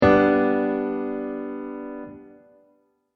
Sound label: German Grand Piano MIDI Voicings; chorducopia